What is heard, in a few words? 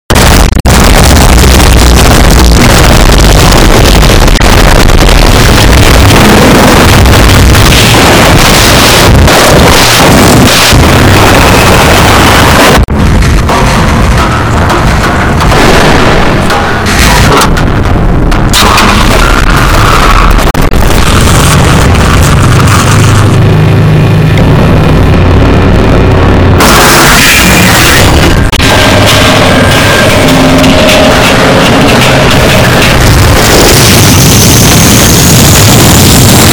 Throwing Lead Extract